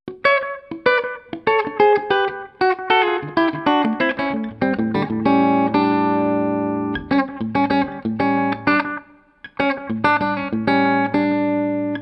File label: Delay; Llama short